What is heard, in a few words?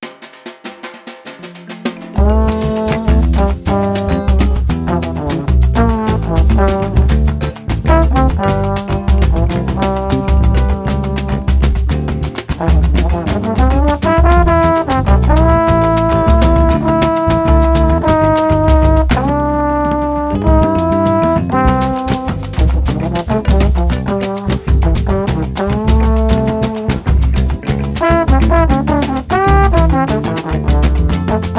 trombone